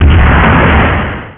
MISL LNCH

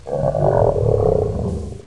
monster

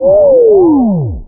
sine wave pulse

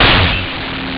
BOOM

